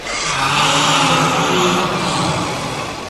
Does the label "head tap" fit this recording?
No